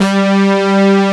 SYN BRASS G2; E-MU Producer Series Vol. 2 – More Studio Essentials; Composer; Sweet Brass

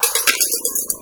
118 PERC, PERC LOOP, Fresh Disco House; USB Soundscan vol.20 - Fresh Disco House I

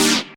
Stab G3, club techno; 90 sSampleCDs

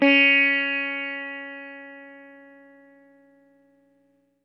EL SITAR HRD; Quantum Leap - Guitar & Bass

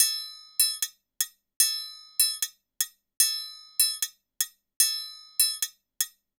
Cajun Triangle; Sonic Foundry (Sony Creative Software); Joe Vitale Latin Percussion

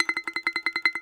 BAGLAMA T1IR; E-MU Producer Series Vol. 7 – Old World Instruments

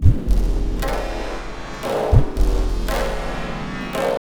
Tabla, 115 BPM; Zero-G; Pure Tabla

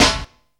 breakthru, snares; Zero-G - Funky Elements

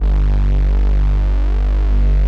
MONSTER; Greytsounds - Scott Peers Sound Engineering

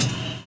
live kick; Univers Sons - Kitch 70s

